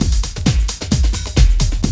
Loop, Roland; Sound & Vision - Gigapack I

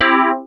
dx7 Synths

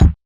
Rhythm Kick Hat, Realistic Concertmate-670; Rhythm Layered Kit

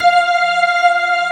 P-ORG F5, POWER ORGAN

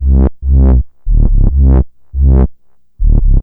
Trance Bassloop 140bpm, Bass Arps

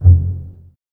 BSS F 1 Pizz, String Ensembles Basses; Miroslav Vitous

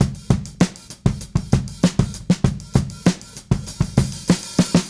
Smooth Groove Drums Fill, 70s Analog Funk; Sonic Foundry (Sony Creative Software); What it Is!

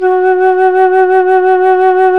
FLT FLUTE, Flt vib; Roland L-CDX-03 Disk 1

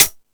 High Hat, E-MU Pro-Cussion

